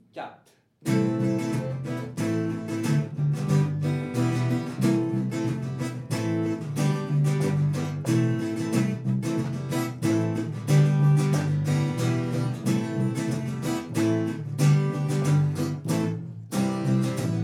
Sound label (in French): clam accords